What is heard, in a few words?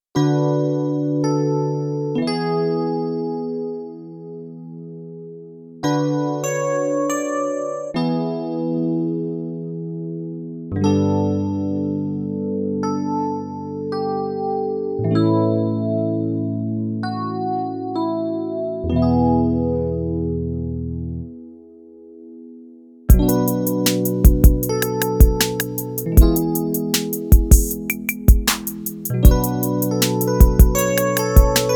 Operator EP, Korg Radias-R